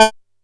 A4, Bass; E-MU Producer Series Vol. 6 – Analogia Project; Boom da Bass

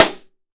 jungle snare chromatic, real drums; ProSamples vol.43 - Real Drum Kits; Best Service

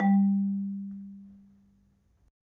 Marimba, G1; Terkelsen

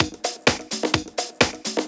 Latin Loop 128 BPM

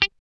GUI G4, E-GUI MUTED; Sound & Vision; Gigapack I CD 2

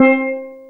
STEELDR LO; CALIMB+STEEL

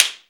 SLAPSTICK; AKAI S-Series CD-ROM Sound Library VOL-5

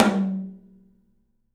3D Percussives, Studio Kit; E-MU Producer Series Vol. 5 – 3-D Audio Collection

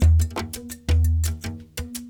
Large Darbuka; Berimbau, Bell Shaker

